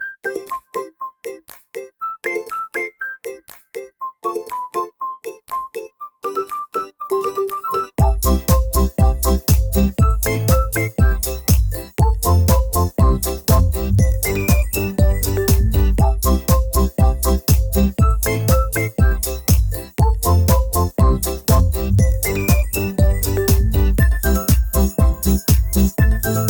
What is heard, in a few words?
dopamine ukulele